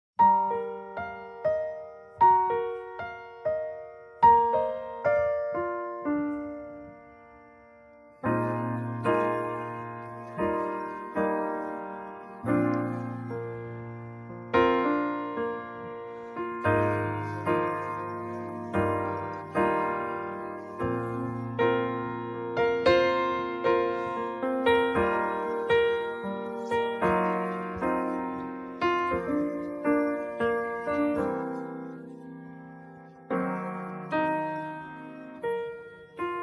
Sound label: Accompaniment Only